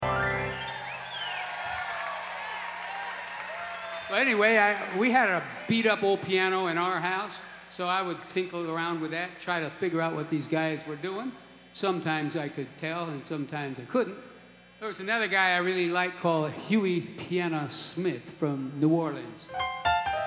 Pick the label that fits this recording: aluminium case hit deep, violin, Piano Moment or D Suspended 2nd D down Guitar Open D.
Piano Moment